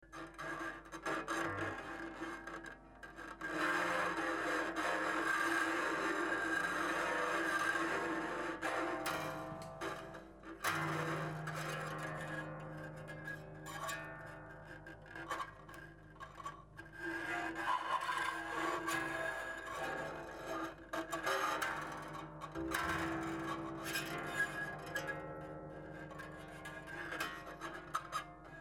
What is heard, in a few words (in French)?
cordes metal scie, harpe